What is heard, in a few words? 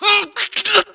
grunt death neck; Titanfall 2